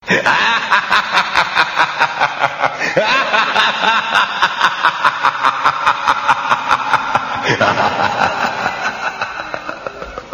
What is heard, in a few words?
Laughing Halloween Sounds Car Horn